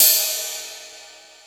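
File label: R8 crash; X-Static Goldmine 1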